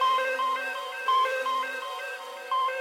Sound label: alien beacon, Zaps and Beeps